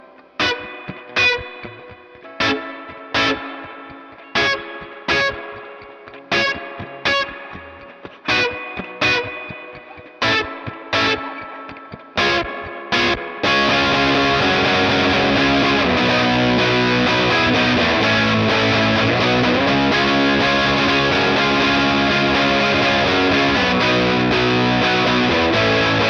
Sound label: rocky verb, tweed; str-tweed